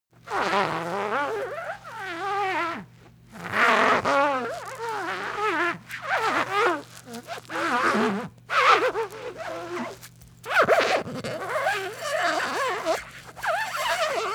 sponge squeak slow

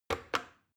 plastic button start click heavy slow

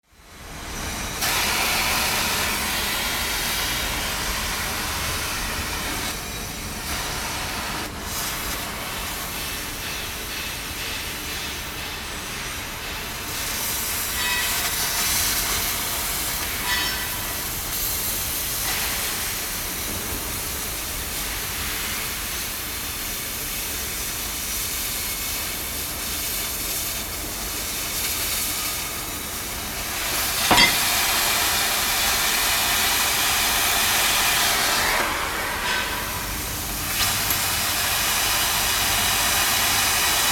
cutting torch

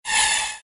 move swish fast

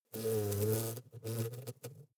hornet hum short

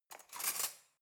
cutlery knife drawer grab

